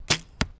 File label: Bow shoot